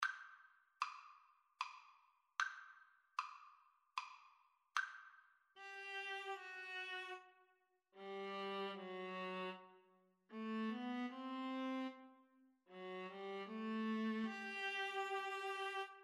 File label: fourseasons, Viola Cello Duet; sum 1stmvt